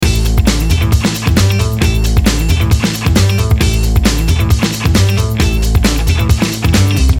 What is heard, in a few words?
Casino Loop